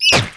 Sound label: Hungry Goblin Hit; Eft